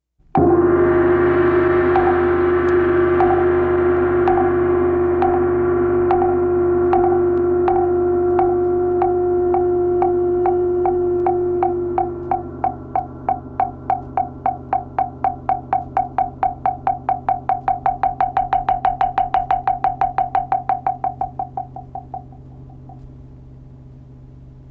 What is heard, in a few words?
woodfish